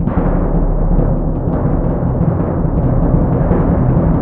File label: BS DRM; ILIO; Synclavier Percussion 2; World & Orchestral